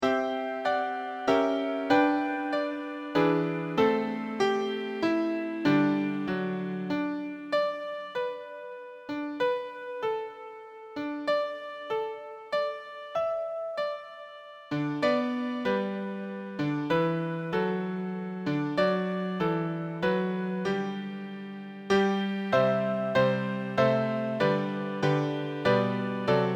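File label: Rehearsal Piano; Rehersal Piano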